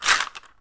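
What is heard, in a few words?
SHARK CALL, ethnic percussion; WorldSounds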